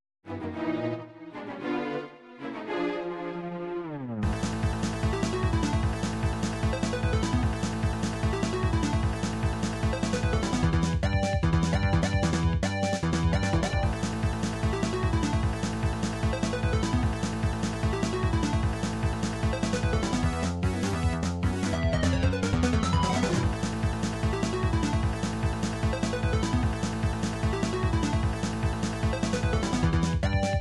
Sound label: helicopter battle; Nintendo Laser Invasion